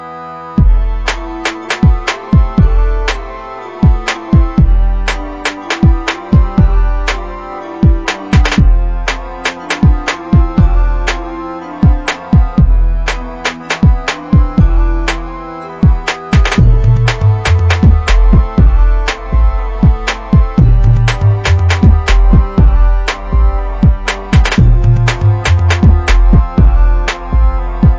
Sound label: Very Cool Beat